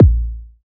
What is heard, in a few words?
puffy kick drum one shot a sharp key, kick sound tuned to the A# note